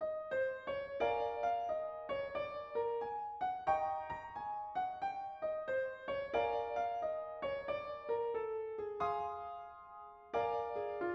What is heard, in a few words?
12 Bar Blues Enclosure